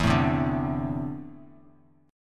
E 7th Suspended 4th Sharp 5th E down Bass Standard; strummed; chord; JGuitar